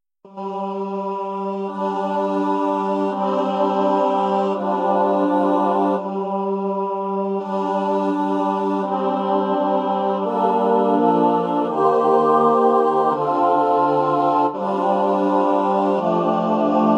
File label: Agnus SATB, Messa a cappella; MESSA BREVE - A SHORT MASS